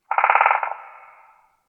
bird woodpecker

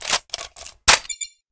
reload rocket; ufoai; weapons

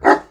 kobold bark

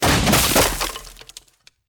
break wood

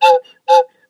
toy train

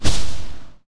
combat rocket; geoscape

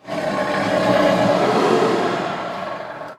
tiger snarl

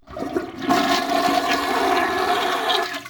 toilet flush

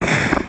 monster

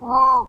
penguin

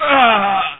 male death

